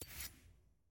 sfx button click on; servicestatus

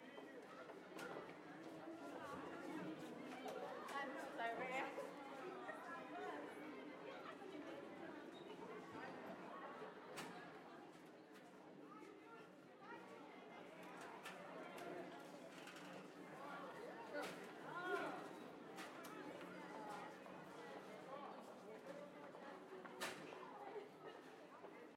amb client

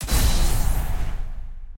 ui opponent fight

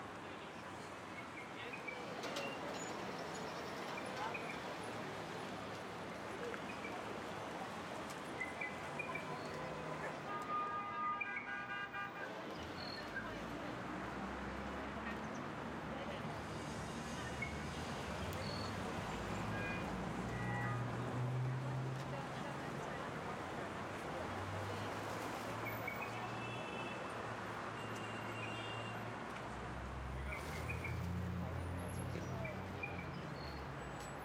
amb

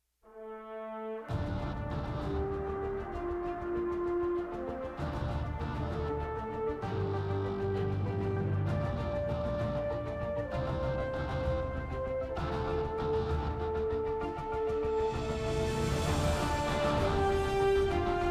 music; odyssey mode

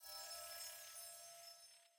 ui event button hover

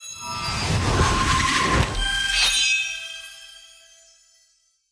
sfx honor level 3 checkpoint

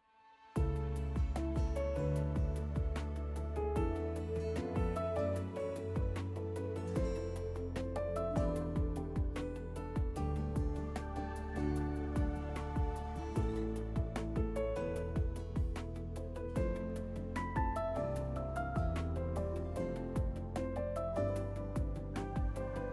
music in queue loop; teamfighttactics set15

